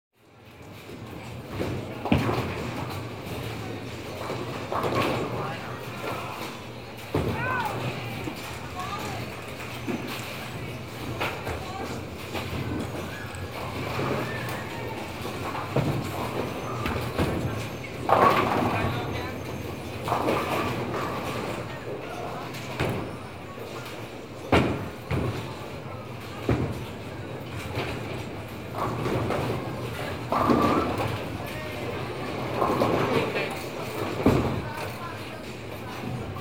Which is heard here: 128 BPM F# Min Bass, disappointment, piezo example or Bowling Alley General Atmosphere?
Bowling Alley General Atmosphere